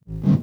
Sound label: BD Gate FX, Bassdrums; Vengeance Samples